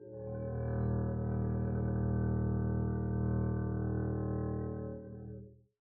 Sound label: arco eb1 p down, double bass